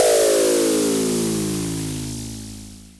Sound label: FX, Dual Percussion Synthesiser; Klone